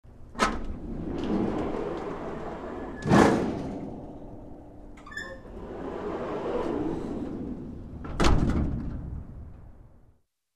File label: The garage door opens, closes